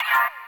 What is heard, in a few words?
Bb STAB; Loopmasters; Funky Underground Vol 2